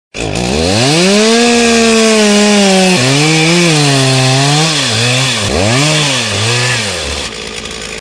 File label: motor saw ringtone